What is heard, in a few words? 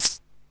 Shaker, Alesis Hr16, Drums